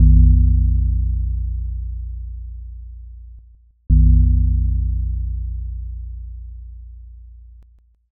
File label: belltoll